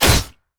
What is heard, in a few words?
basic attack claw; rengar